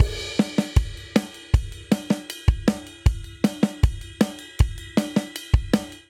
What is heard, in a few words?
Twist Chorus 157 bpm, drum; 4 4; MIDI Clips; Ableton; Factory Packs; Drum Booth